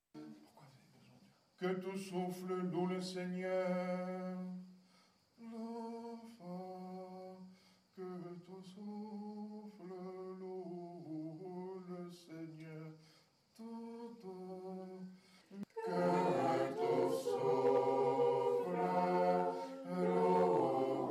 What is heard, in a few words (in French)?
Que tout souffle loue le Seigneur